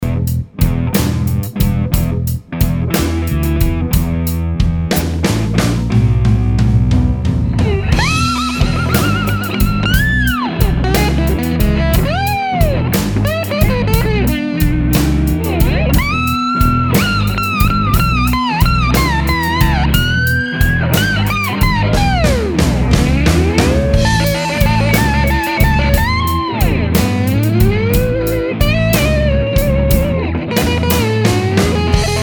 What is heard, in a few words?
slide mix, guitar